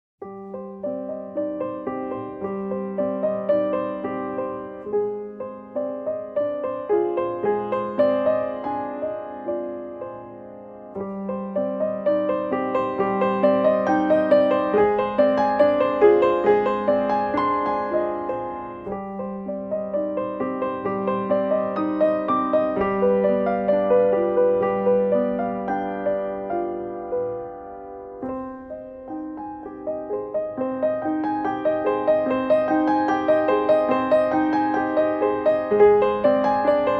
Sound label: Solo Piano